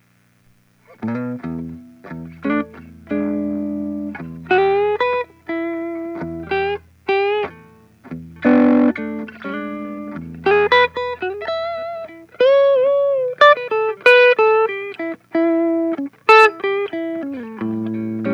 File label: blues pre; BluesPreamp